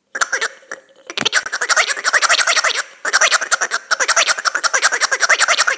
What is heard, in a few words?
pink dog toy; Willow the Whippet; certified pedigree whippet dog